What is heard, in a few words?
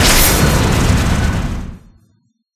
Magic; Life of a Naomi